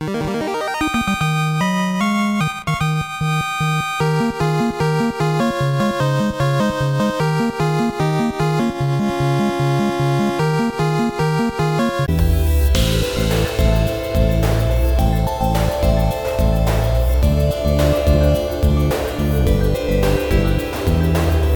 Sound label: Victory Fanfare